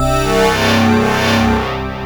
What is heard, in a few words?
HEAVY WAVES; E-MU Formula 4000 Series Vol. 3; Analog Odyssey